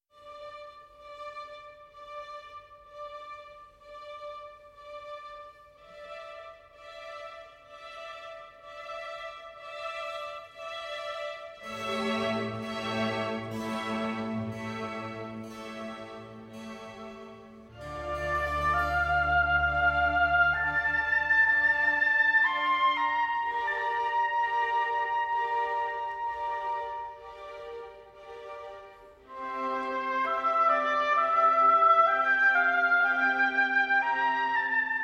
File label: Oboe Concerto in D Minor - Adagio; Подранки. 1976